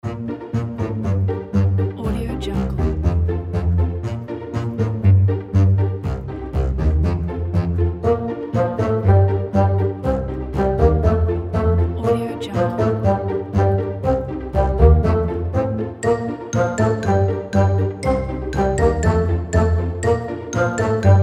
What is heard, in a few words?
funny comical ident; stylishproject